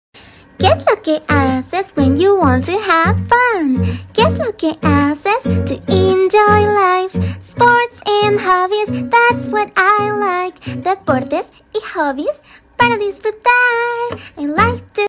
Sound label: Hello Hola sing along learning songs